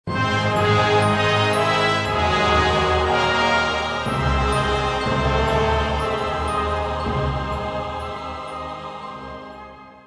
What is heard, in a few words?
Mission Success; newworlds